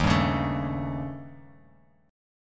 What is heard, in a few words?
Eb 7th Flat 5th, Bass Standard; 11,10,11,14; Eb7b5 strummed; JGuitar